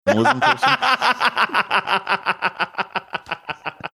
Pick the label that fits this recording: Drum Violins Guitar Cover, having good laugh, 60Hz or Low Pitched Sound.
having good laugh